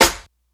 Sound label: snare tambourine